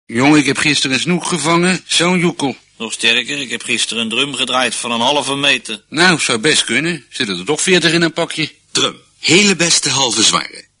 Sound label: drum